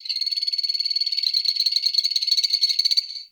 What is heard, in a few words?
Beach Night Poison Frog; Warframe; Grineer Beach